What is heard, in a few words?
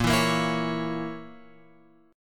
A sharp Diminished, chord; A#dim Chord {6 7 8 9 X 9} strummed